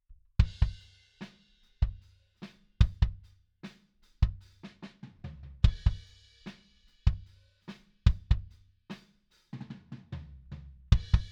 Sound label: Kick